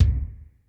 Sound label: ROOM K, ROOM KITS; Crypton - DrumBase5000